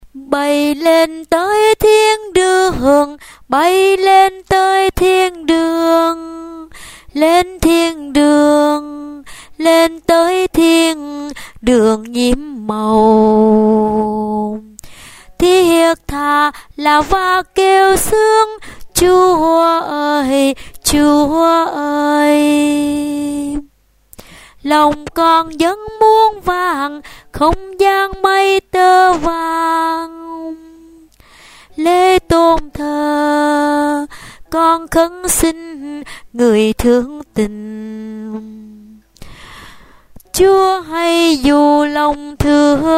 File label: alto